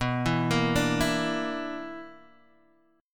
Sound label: B-Minor Major 7th Double Flat 5th B down Guitar Drop D